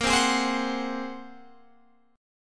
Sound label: A sharp 7th Suspended 2nd Sharp 5th, Guitar Open F chord; strummed; JGuitar